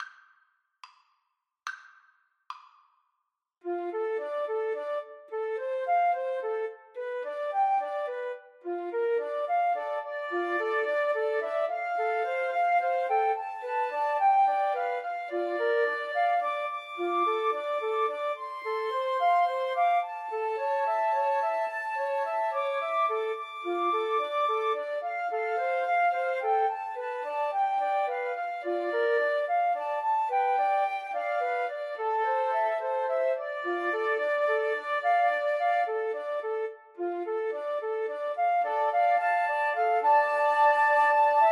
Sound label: house of the rising sun flute trio